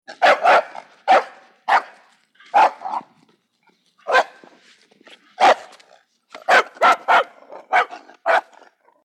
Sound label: Dog Small Mean Barks Vintage; Dog Sound Effects; Dogs (Sound Effects:Animals)